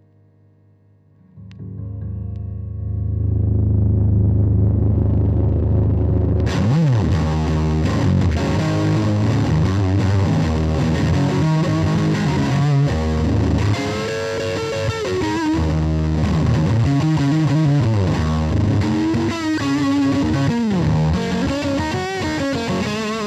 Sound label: strat drive